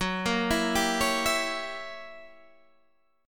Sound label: Gb 7th Flat 9th Gb down Guitar Standard; arpeggiated